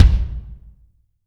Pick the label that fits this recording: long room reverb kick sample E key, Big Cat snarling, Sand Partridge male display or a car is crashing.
long room reverb kick sample E key